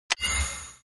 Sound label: Click icon